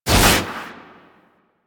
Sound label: Cloak Pulse End; Powers; Warframe; Focus; Operators